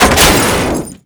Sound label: suburban crash impact; physics; Call of Duty Modern Warfare